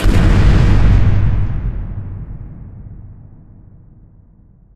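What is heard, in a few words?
explosion distant medium; Source Engine; Portal 2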